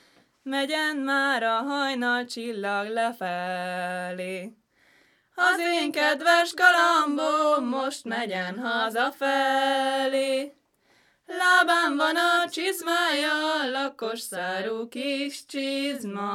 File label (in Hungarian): nepdal; megyen már a hajnalcsillag lefele